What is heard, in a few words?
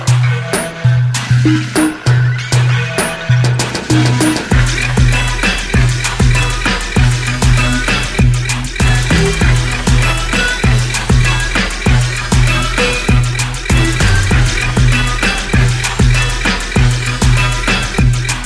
newragga